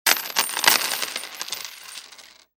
monety padajut na stol kuchkami

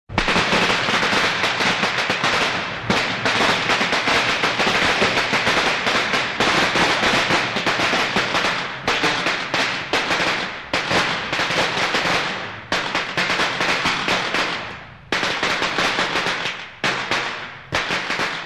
kitajskij novyj god; Звук петард

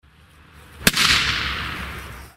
otdelnyj vzryv petardy; Звук петард

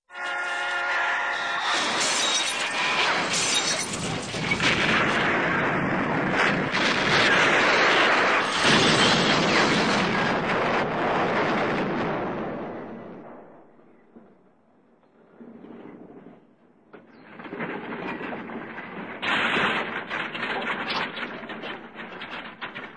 Staraya zapis signal tormoz udar padenie v propast

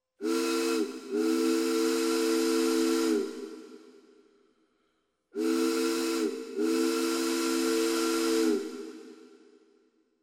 gudok korablia

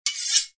chirk ob drugoj nozh ili metall; Звук ножа; Звук метания ножа

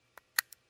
povorot barabana taurus 942 22lr

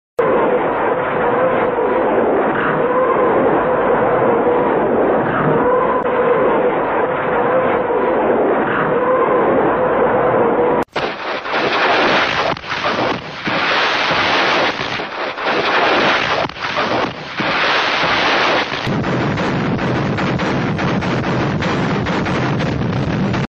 streljaet katjusha

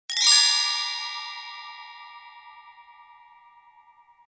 vzmah

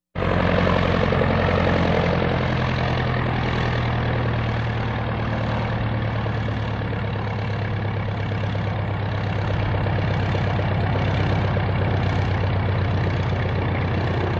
Kukuruznik rulezhka

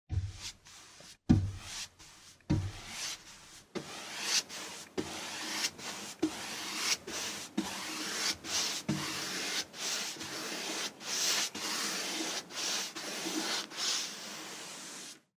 shirokaja kist maljarnaja po steklu; Звуки кисти художника или маляра

